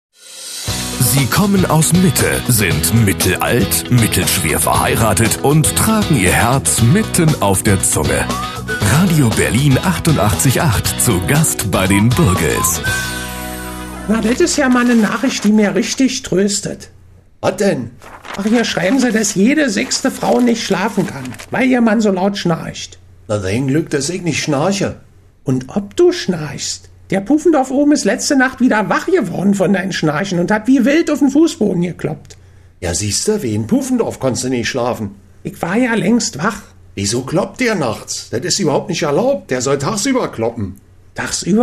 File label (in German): schnarchen